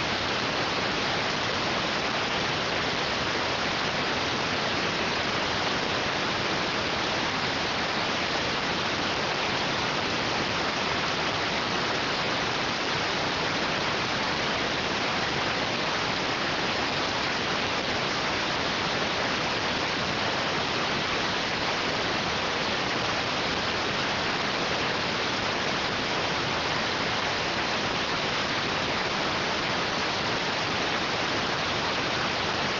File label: rtty rx